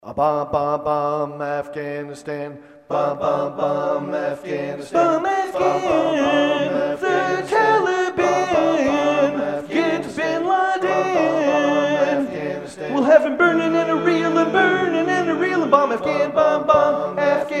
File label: Acapella